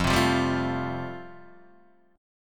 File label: F Major Add 9th, Guitar DADGAD